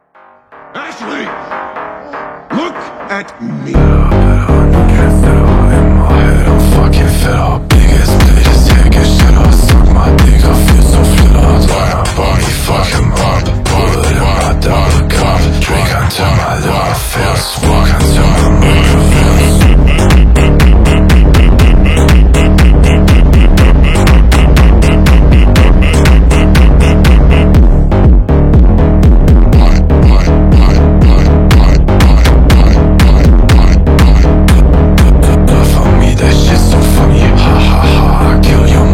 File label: Phonk Music; فانک phonk